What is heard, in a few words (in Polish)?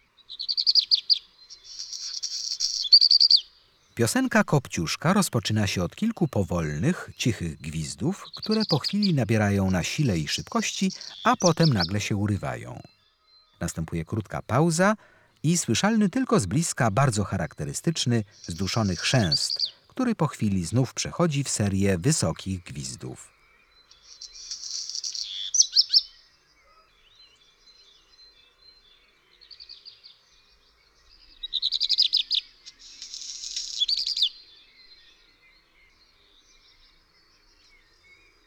Kopciuszek, Ptaki; Kurs PTTK